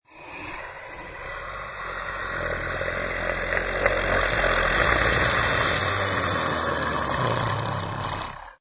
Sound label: Monster Animal Sneer; Monster growl or snarl: Large animal or monster sneering and growling, threatening, menacing; Horror creature sneer; Lynne Music